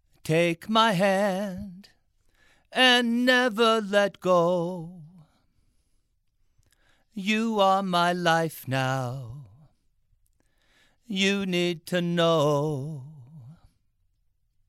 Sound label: Male Vocals